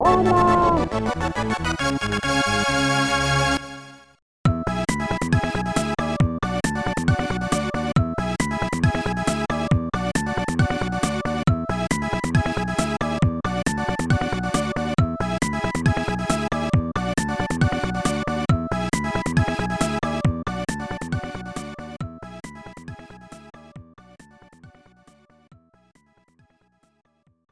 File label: Home Run, with voice; Super Famista 3; SNES